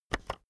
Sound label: keyboard external enter press fast